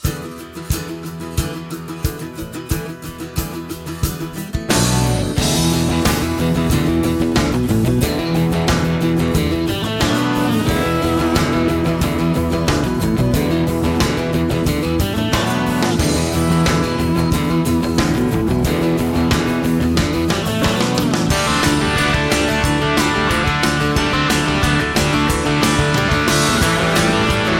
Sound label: gameplay BGM